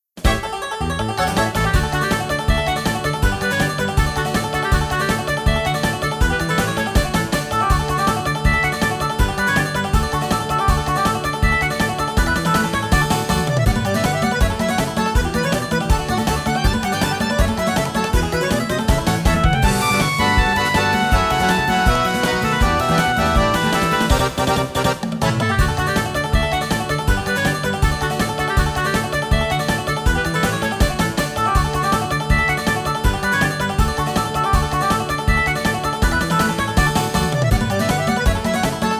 Grass; Kirby Air Ride; Top Ride

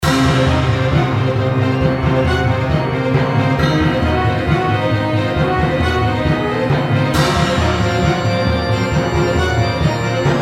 Bloody Battlefield; VR Dungeon Knight

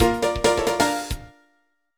Jingle Star; Super Mario 64 DS; NDS

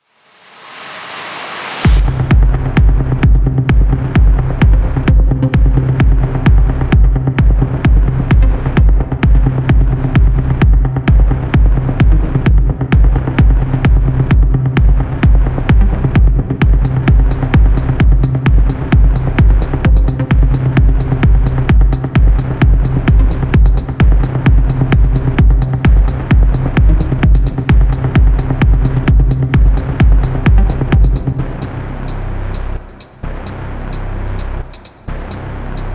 boss Music; volt windows gamerip 2014

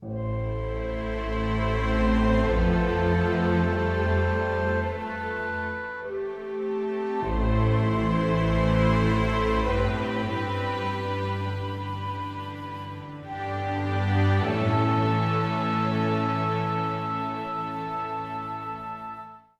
tram depot end; Enemy Front

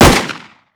pistol fire

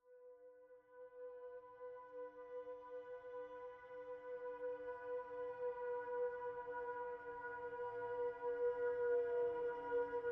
Tension Mood

